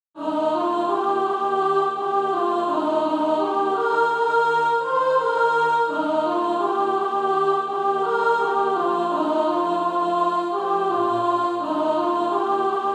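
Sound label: soprano, SATB Practise Music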